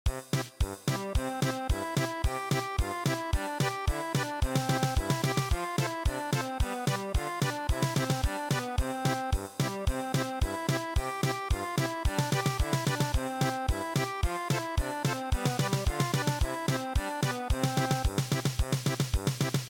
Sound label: German Polka